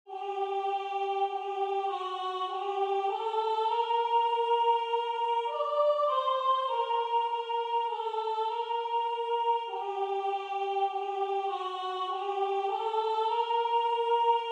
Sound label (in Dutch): Sopraan; Een lied tot Jezus Christus